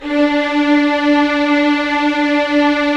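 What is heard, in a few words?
Strings Violins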